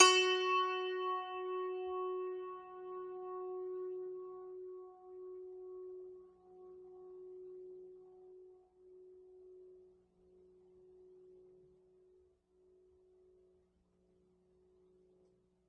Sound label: ZITH F, zither; multisampled; world